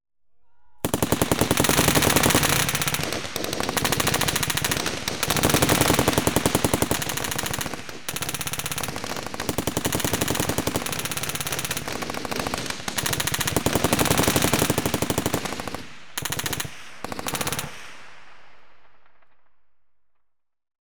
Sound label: Warfare, Machine Guns Firing; BBC Sound Effects Library; Explosions Guns Alarms